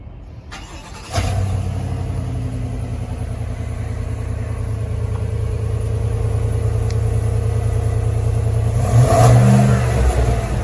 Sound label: cadillac escalade